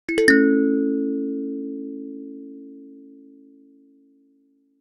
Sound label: piece of cake; notification sounds